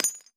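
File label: bullet case bounce 50bmg dirt; gmod; viper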